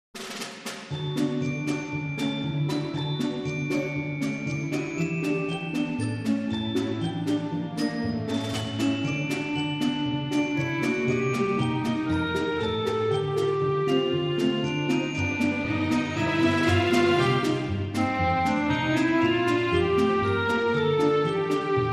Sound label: No Opening Fanfare